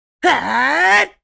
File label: Attack shout anime boy vocalization; gfxsounds